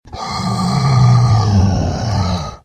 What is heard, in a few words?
Monster screams